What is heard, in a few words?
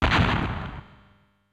Fireball Hit; Mario; Super Smash Bros